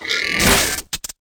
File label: attack; Mob